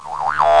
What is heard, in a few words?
synthesizer, electronic sounds